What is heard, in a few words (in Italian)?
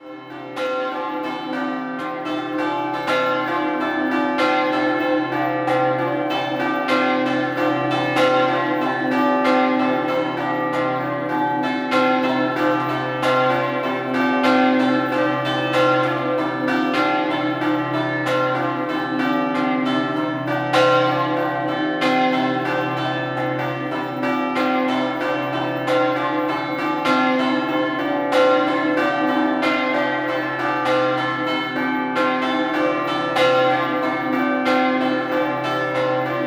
San Vito Scampanottata